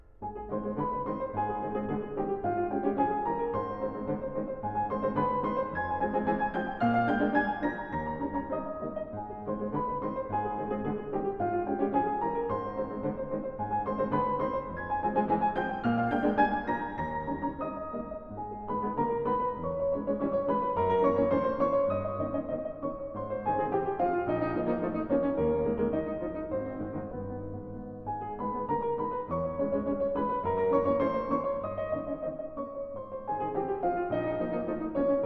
Carnaval for piano; Reconnaissance; Schumann

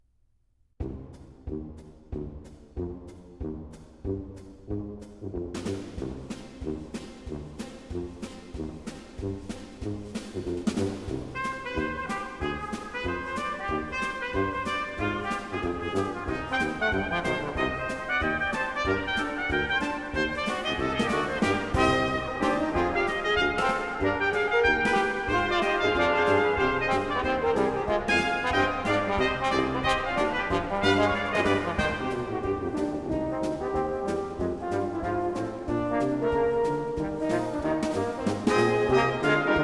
Prelude and Fugue, for keyboard in C minor; WTC I/2; BWV 847 (BC L81); Bach for Babies; Fun and Games for Budding Brains

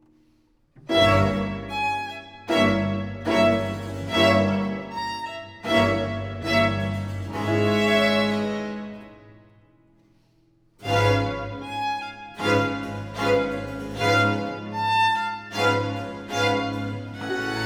violin, strings & continuo; Adagio; C major, RV 175; Vivaldi; Concerti per Violino

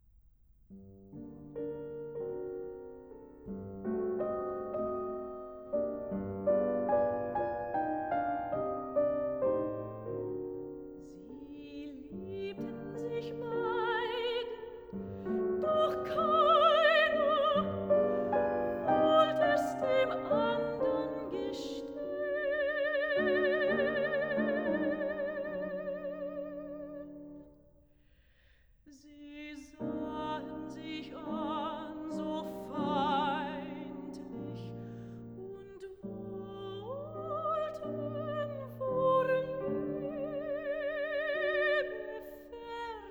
Songs for voice & piano; No 2, Sie liebten sich beide; Robert & Clara Schumann

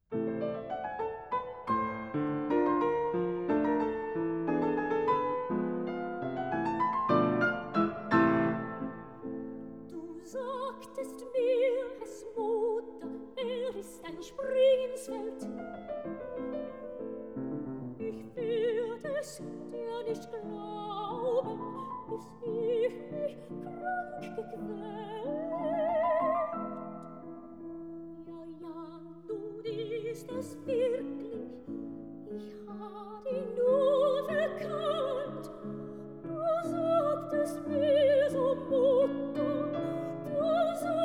refrain song for voice & piano

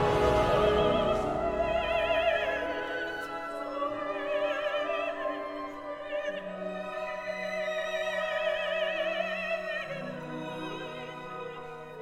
Symphony in E-flat major; Mahler Symphony No 8

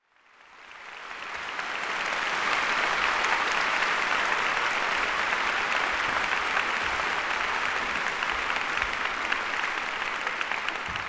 Applause; Deutsche Grammophon

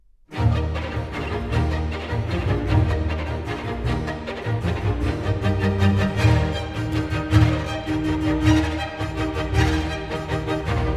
cello, strings & continuo; E flat major; Allegro; Cello Concerto; RV 408; Vivaldi

